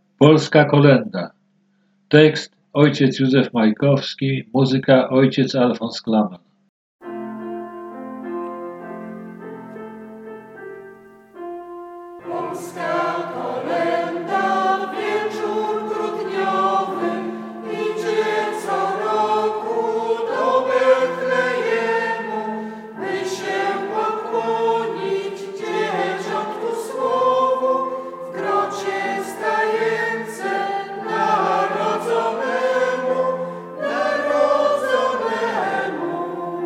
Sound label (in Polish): Polska koleda; koledy